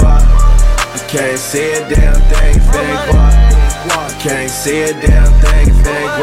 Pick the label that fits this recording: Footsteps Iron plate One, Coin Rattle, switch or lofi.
lofi